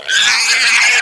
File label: pig pain